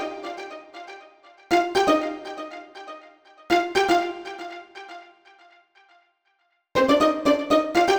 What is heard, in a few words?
Pizzicato, 120bpm; Zero-G; Nu Disco; Nicengroovey C